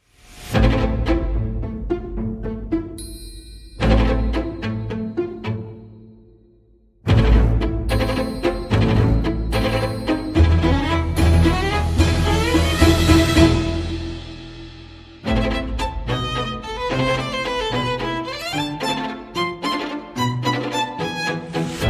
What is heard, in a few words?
Strings Percussion and Effects